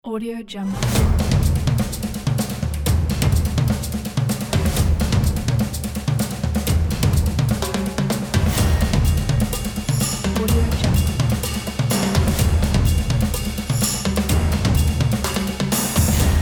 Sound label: songo drums and the beast